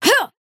effort dash

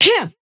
effort melee small

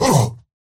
pain small; Slork

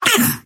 pain small

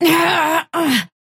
pain death

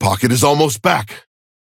ping synth almost respawn

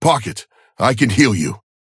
ping can heal synth

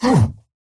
pain small; Slork

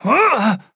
effort dash; Trapper

